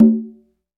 Conga Lo Open mf; Roland BK-5; Percussion

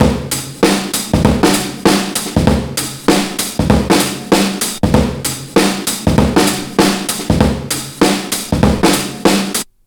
97.2 bpm; BONITA; 90 s Sample CDs; Planet of the Breaks

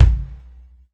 Kick, Live Kit; Sonic Foundry (Sony Creative Software); Ugly Remnants VOL-2; Single Shots; Beatstuff

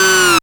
fx F#, Rave FX, Dance Synths

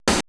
drum, 80s Drum Set, DRUMCOMPUTER; Best Service ProSamples vol.55 - Retro Sampler

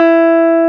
GBSN 335 E4, JAZZ

